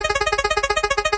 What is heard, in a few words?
tick